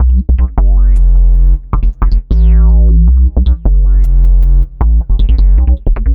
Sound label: Synth Bass; Sonic Foundry; Leo Cavallo Bass Taster